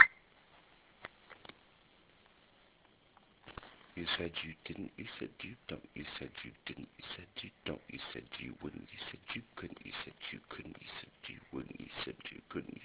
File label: Idea Rhythm Possible vocal for it